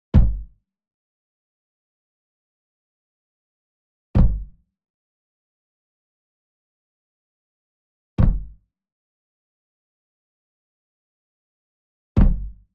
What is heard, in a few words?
SMALL DRUM Tabla Flam Soft Beater Near; boom library; Cinematic Strikes Construction Kit